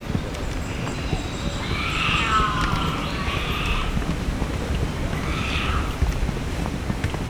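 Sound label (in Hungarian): gyaloglás közben, puma; szegedi zoo 2011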